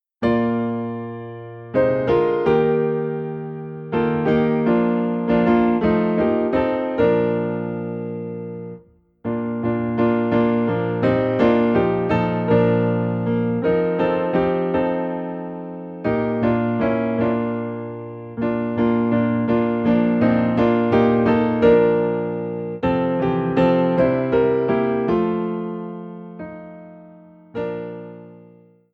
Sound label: Piano in B Flat